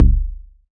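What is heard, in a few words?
crunchy kit kick; STYLE KITS (Hip Hop, Etc)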